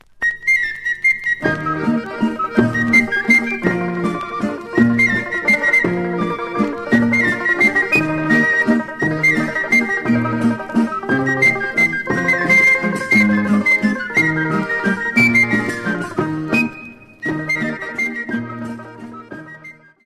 Mazurka Sicilienne - Italie - Sicile

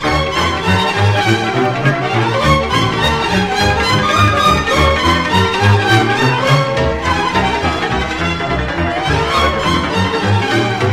Sirba - Roumanie

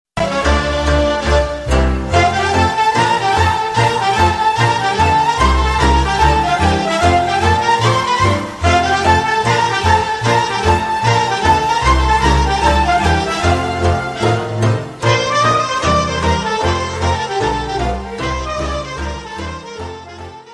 Suita orchestrala din zona Sibiului - Roumanie

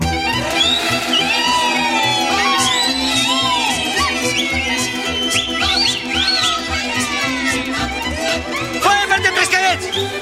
Sirba - Roumanie

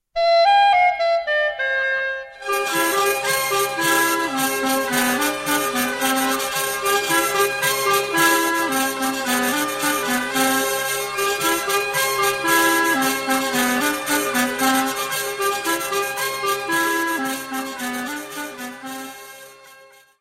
Rigaudon de Bresse, France, Bourgogne

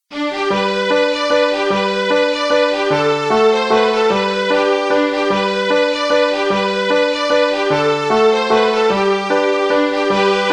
MAZURKA - France - Correze

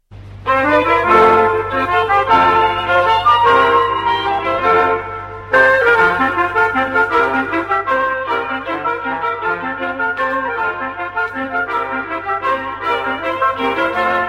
Polka, Allemagne

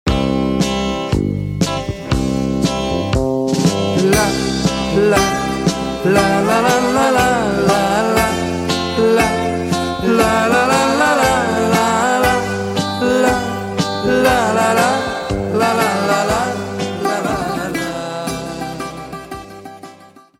Syrtaki - Grece

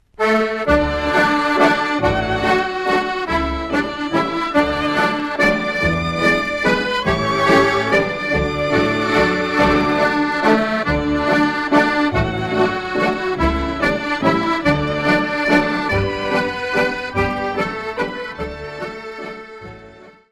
Walz - Ecosse

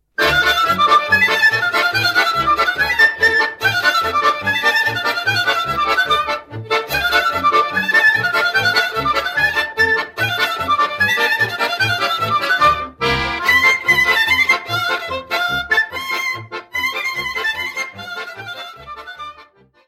Corobeasca - Roumanie

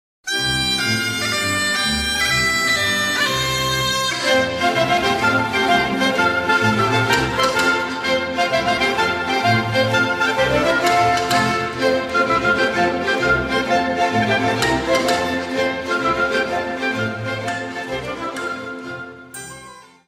Grand cercle Mixer, Belgique

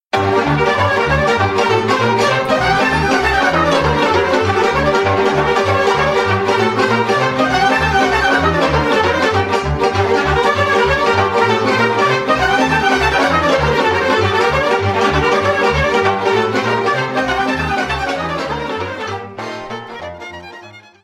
Sirba - Roumanie

